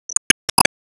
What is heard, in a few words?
Emotion Glad; disney star smash android ios mobile gamerip 2020